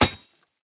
Tree Sword Hit; Folk Hero

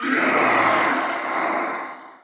Magician Death

